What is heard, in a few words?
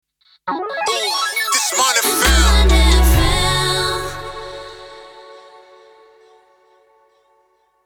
Jingle Sting